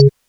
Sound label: C#3, Synth, Arp; GrooveMaker